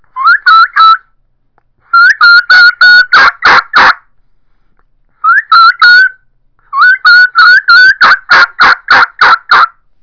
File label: stingray mouth call keekee runs, turkey; Southland